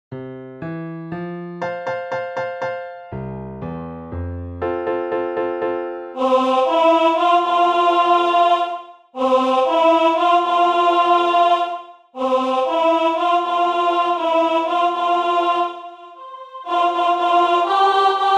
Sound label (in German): Sopran2; West Side Story